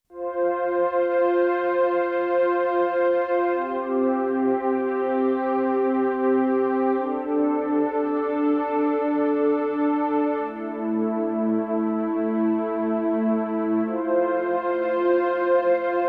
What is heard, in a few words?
Harpsichord